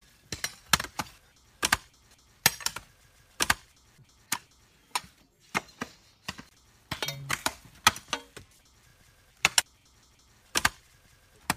tieng cuoc dat boi dat lam vuon tren dat soi cung

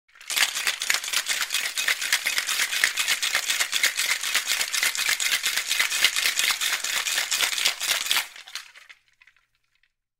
tieng lac binh lac cocktail pha che do uong

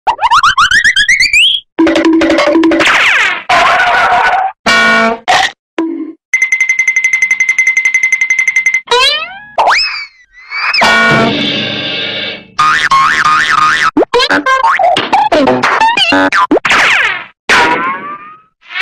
tong hop am thanh hoat hinh ngoc nghech hai huoc

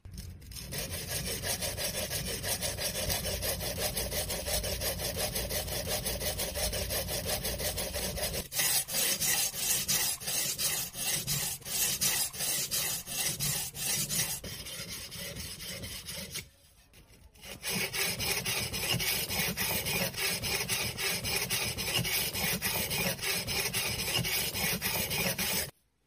tieng mai dao keo nhieu kieu

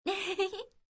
tiếng cô gái cười hi hi

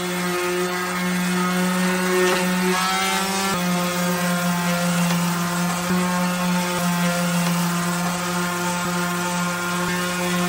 tieng cha giay giap giay nham bang may cha nham rung

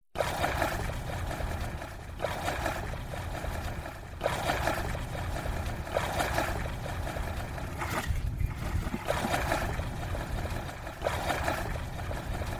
tieng song vo man thuyen

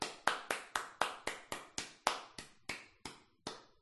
tiếng vỗ tay nhỏ một người vỗ

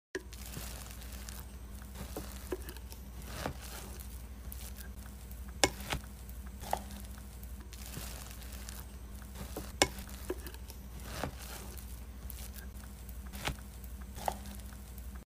tieng tron thuc an do an sot soat

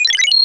hiệu ứng âm thanh tiếng robot

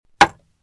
Tiếng ọc ọc khi Uống nước

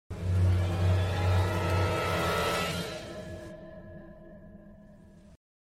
sfx cang thang

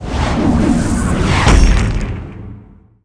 âm thanh tấn công bằng ma thuật

